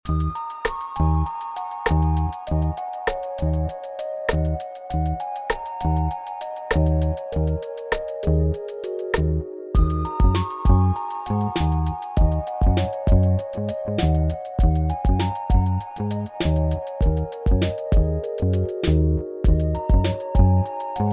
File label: cool hip hop loop